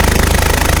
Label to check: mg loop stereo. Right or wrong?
Right